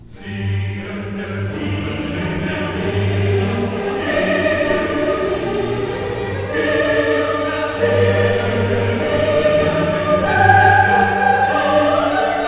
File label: Chorus; Keiser; Siehe er rufet den Elias